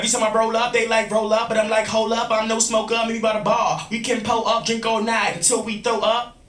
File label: rap